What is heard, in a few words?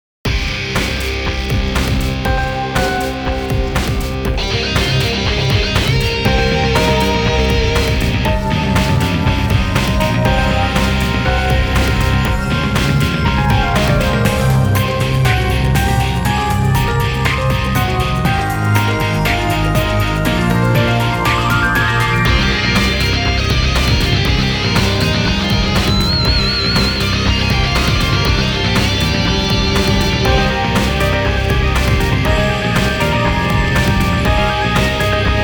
Orgel; dot hack Link